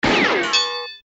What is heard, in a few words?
gun richochet; Crash Bang Boom